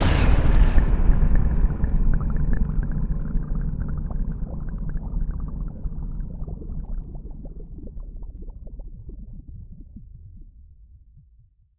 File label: Blast Bubbled Remains